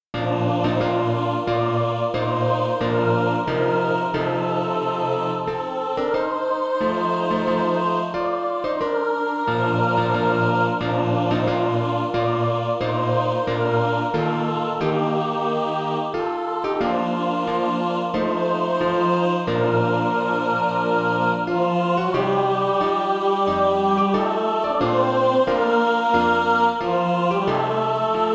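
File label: mixed, SATB Practise Music; Christ Is Born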